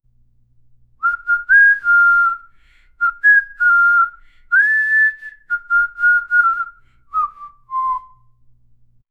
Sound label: a single person whistles, tense